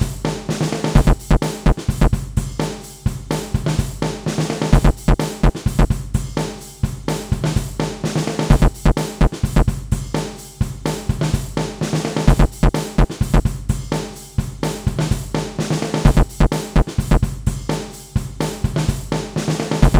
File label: celebrate percussive